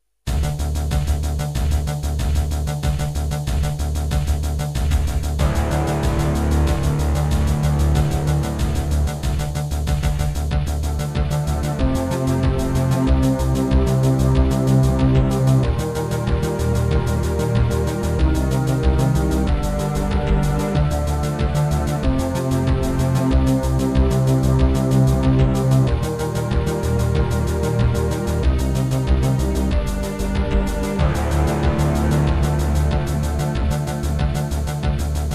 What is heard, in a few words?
synth